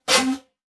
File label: Multimedia Internet CD-Rom Flash Whoosh Short